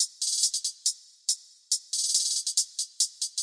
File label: verby trap hats, drum loops and breaks; 140 bpm; 131 to 140 bpm